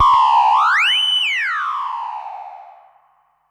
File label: Classic FX; radiosonics; Classic Simple OSC FX